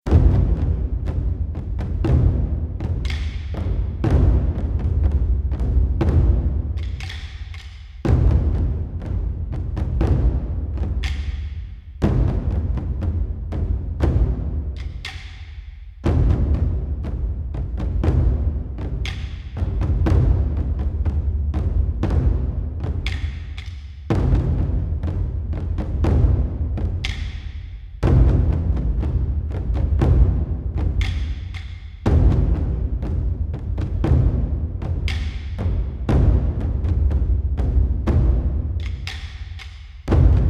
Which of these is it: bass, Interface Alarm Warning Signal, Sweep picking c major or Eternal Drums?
Eternal Drums